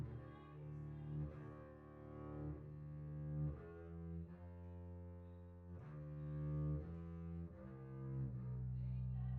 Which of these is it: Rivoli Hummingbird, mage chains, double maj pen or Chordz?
Chordz